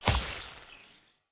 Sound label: Idol Projectile Default, Weapon Impacts; Idols